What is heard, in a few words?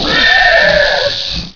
pit death, pitworm